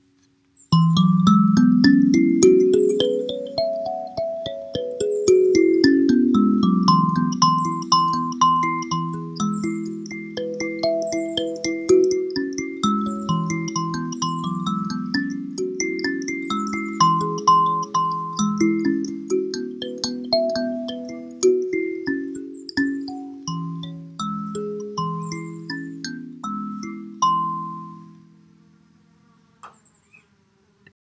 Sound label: Kalimba 12 Tone E major pentatonic